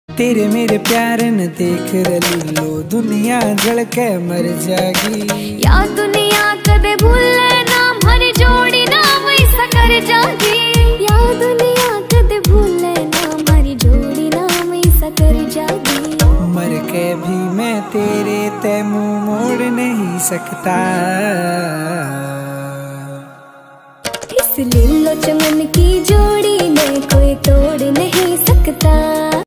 haryanvi ringtone

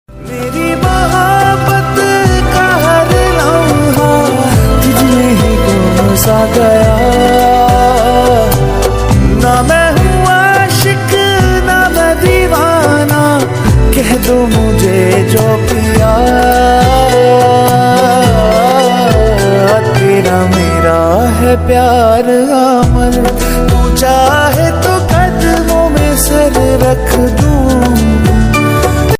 pakistani ringtone

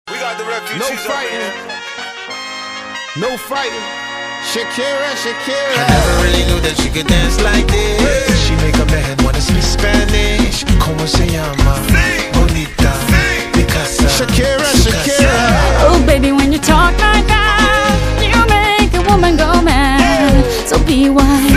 english ringtone

